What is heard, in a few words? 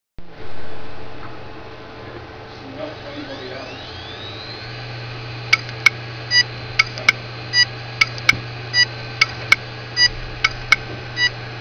ibm deskstar buffer corruption, hard disk; Data recovery IBM Deskstar 60GXP, 75GXP, 120GXP and 180GXP families